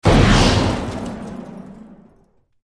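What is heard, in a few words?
Explosion Glass Debris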